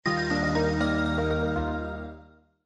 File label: Shutdown, phonetones Power Up-Down; LN510 Rumor Touch